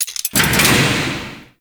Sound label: Heart Vault Platform Ascend; Ambience; Gameplay; Warframe; Infested Eris